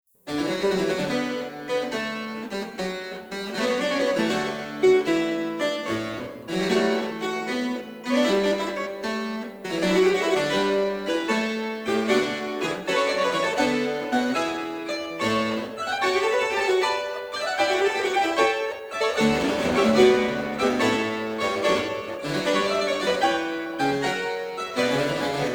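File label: fugue D major, Flemish harpsichord; historical instruments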